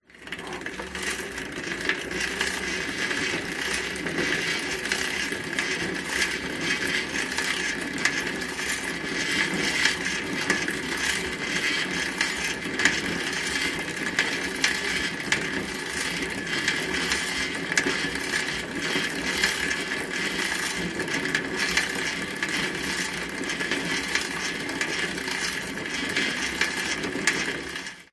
bicycle pushbike pedaling old rattling; vehicles; zapsplat

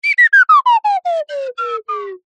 cartoon slide whistle descend stutter slow

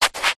footstep sneaker scuff movement paved ground; foley; zapsplat

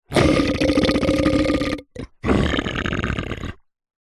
horror monster creature growl snarl angry

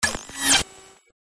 Electro Magnetic; PMSFX

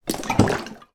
food plastic bottle of olive oil movement

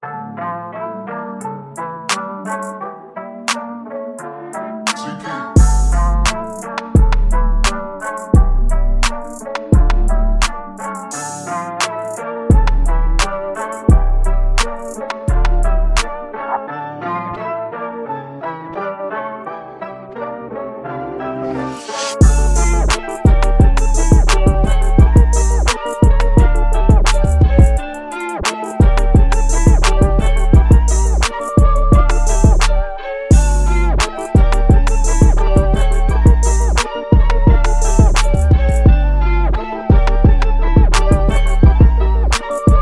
music 173bpm dmin

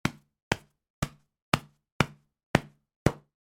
sport baseball bat foam hit hand multiple; sound effects one

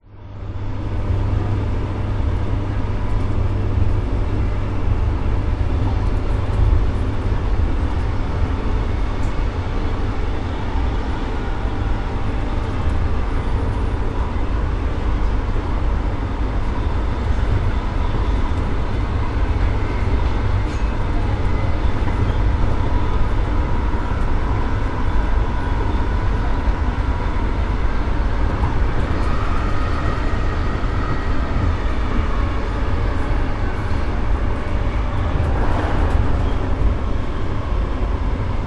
brisbane train internal moving between stations

